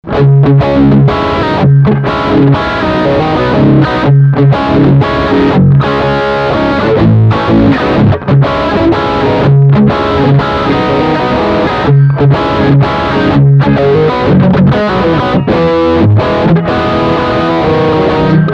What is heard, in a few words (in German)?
Riff Crunch Steghumbucker